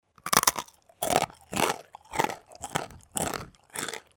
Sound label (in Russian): gryzet zvuk